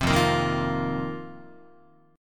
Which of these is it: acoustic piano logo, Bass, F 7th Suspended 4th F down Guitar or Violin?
F 7th Suspended 4th F down Guitar